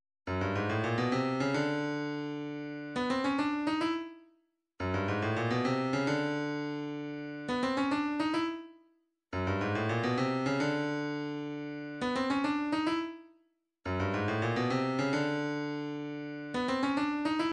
djembe

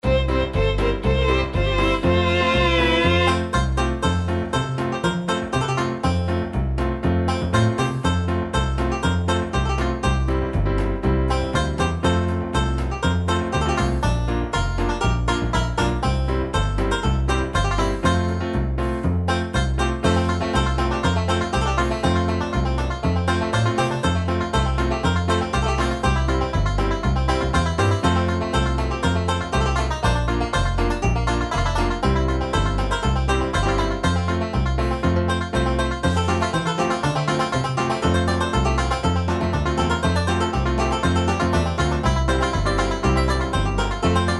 hoedown, midi

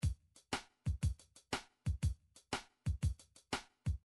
midi drums

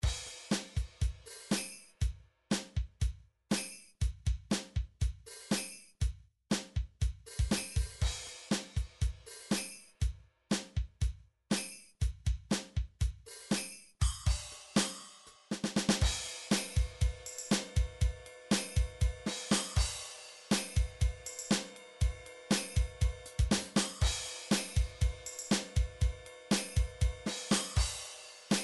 drum midi files rock

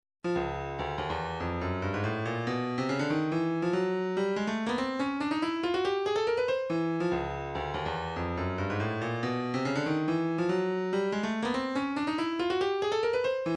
142 fx loop

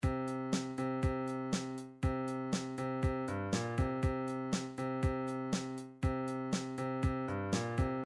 rock, midi

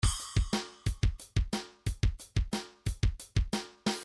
shuffle 2 bar

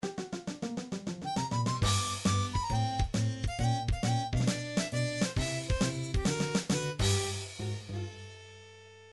game over music; snes bubsy the bobcat

